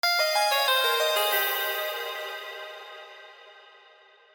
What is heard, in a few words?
synth phrase before; nacre